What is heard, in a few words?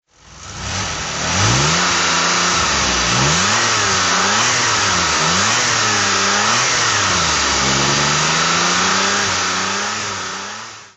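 delorean throttle, engine sound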